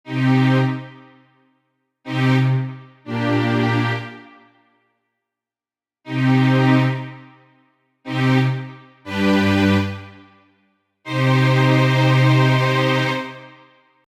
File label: Strings GarageBand